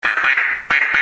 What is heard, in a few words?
duck call